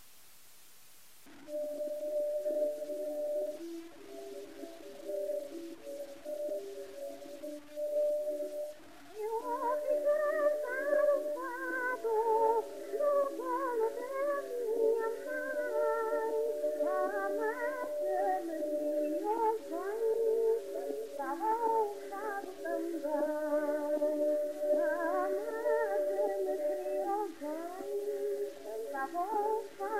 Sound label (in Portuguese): fado corrido